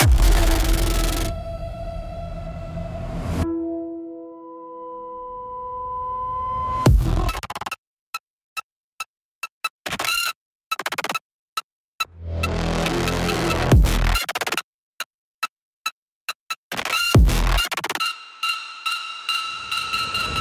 Shattered Alarm, aggressive panic for direct fear and jump